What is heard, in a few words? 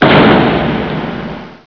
Gun Shot; Mad Cow Roulette